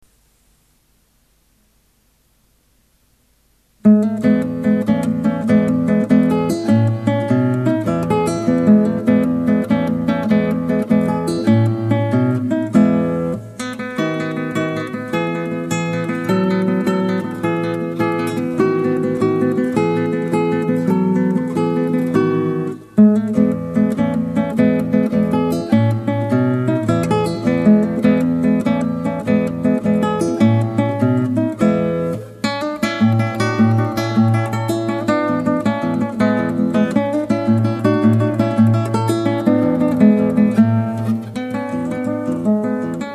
Allegretto, guitar

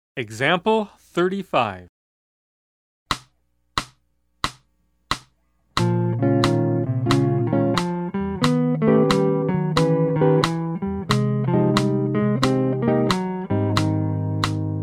Jazz; Complt Jazz

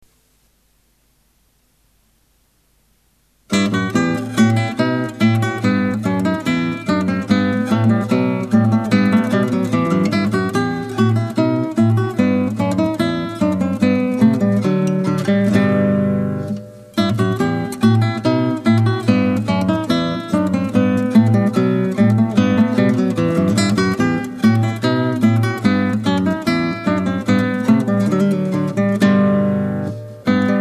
Bouree, guitar

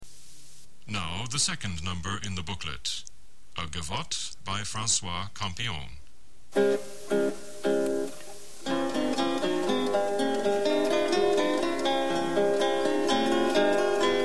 gavotte, guitar

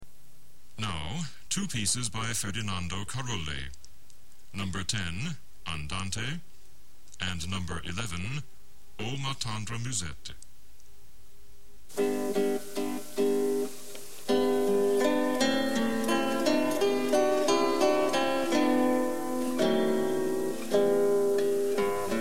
andante

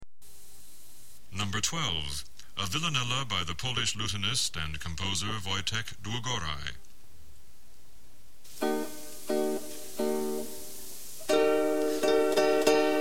villanella, guitar

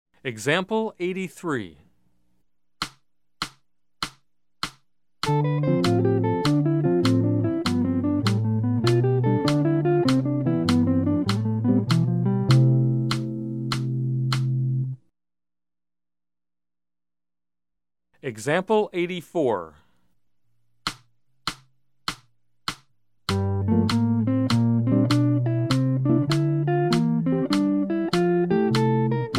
Jazz

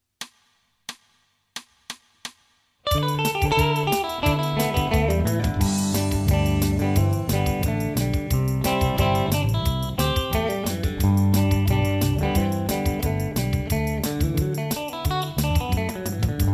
Country Pickn

